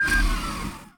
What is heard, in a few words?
drone spawn; Risk of Rain